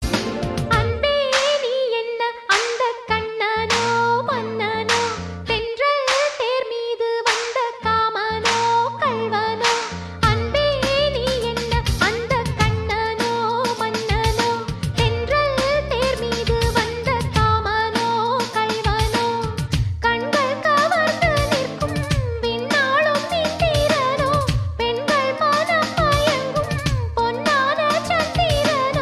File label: Female